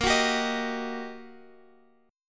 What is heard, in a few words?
G Minor Major 7th Sharp 5th, Bb